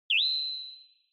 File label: Flash High Short; Onoma; オノマトペ 光る; 高 短